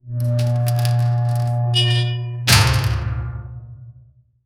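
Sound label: heavy metal castle gate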